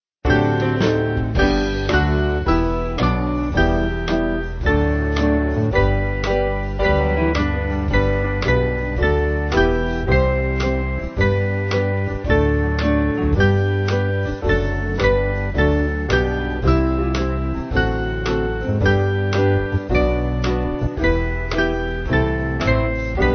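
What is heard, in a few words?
band, Music Backing Files for Churches; All people that on earth do dwell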